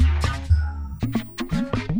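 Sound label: Music Base 120; from dust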